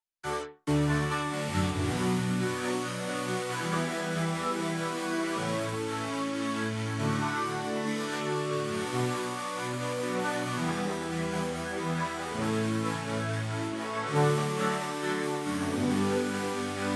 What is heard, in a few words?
Synth